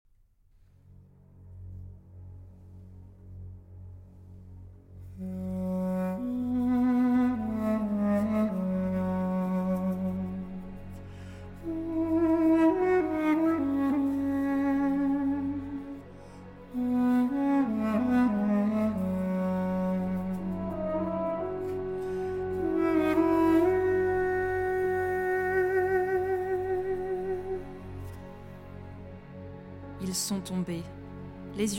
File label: Cello and Orchestra